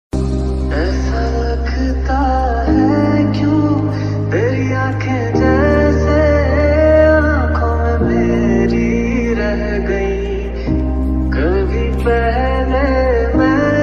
Hindi Sad Ringtone

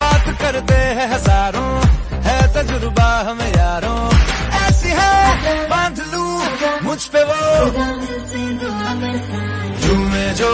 Hindi Ringtone

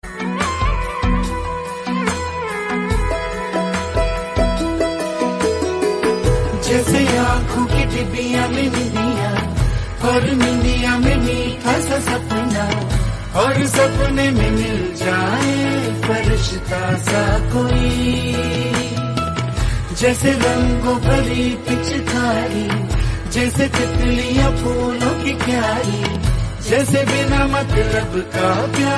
Song Marathi Ringtone

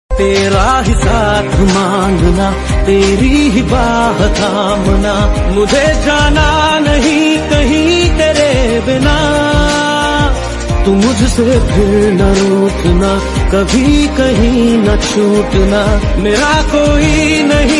Sad Status Ringtone

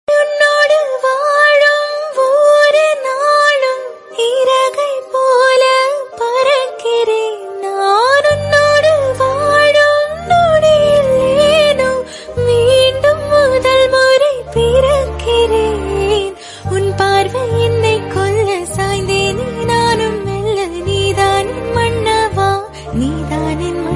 Female Ringtone

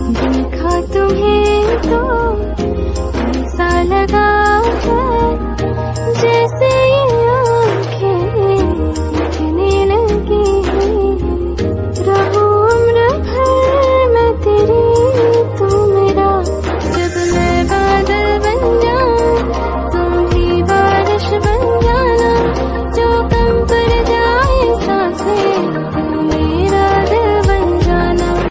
Female Version Ringtone, Hindi Song Ringtone

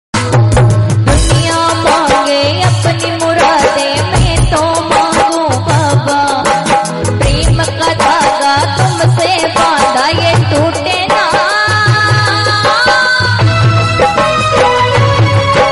Bhajan Song

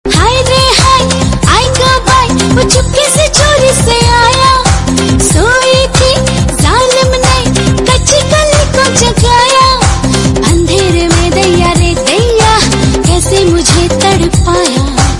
Marathi Female Song Ringtone

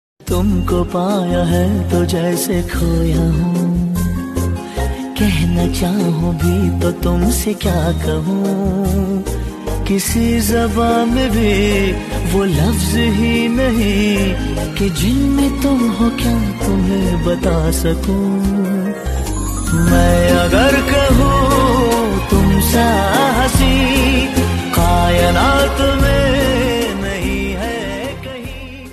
Bollywood Song

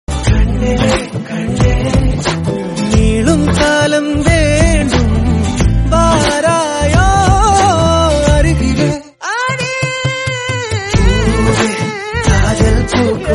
Tamil Song Ringtone

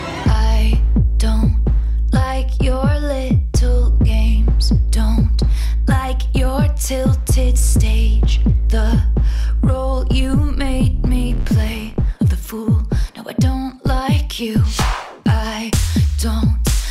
Romantic English Ringtone

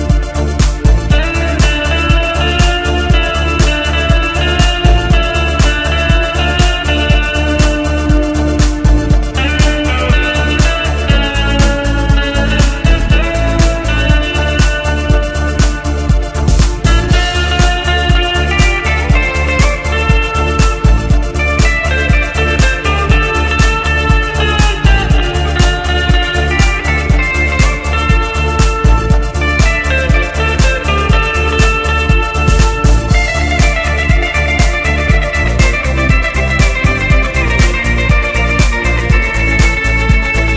Instrumental

